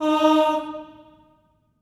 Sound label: STACCATO D#4, Symphony of Voices; Spectrasonics